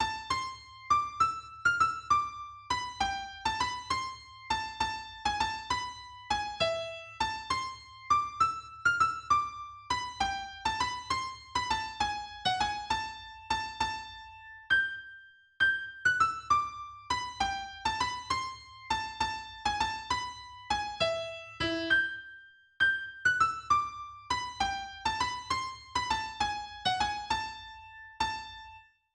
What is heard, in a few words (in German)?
Greensleeves Solo EGit